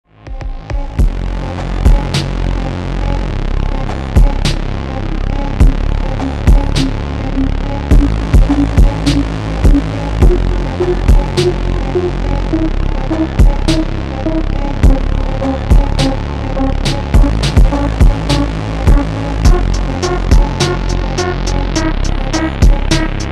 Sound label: slowed hard style instrumental